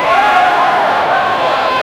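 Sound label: excited stadium atmosphere, applause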